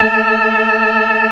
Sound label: organ, all stops; Keith Emerson Signature Series